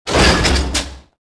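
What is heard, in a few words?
footstep, atst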